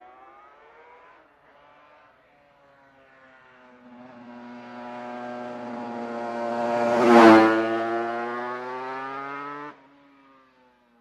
Motorcycles High Pit, Motorcycles, High Pitched Whine Drive By, Long In